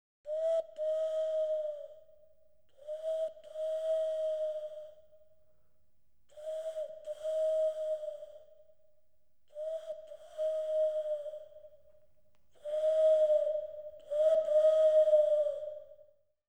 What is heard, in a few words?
Animals, Owl; BBC Sound Effects Library